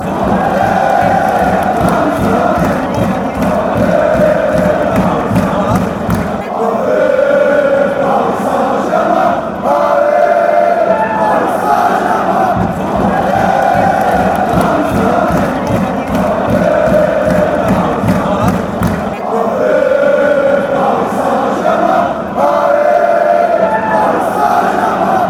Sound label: come on psg fanchants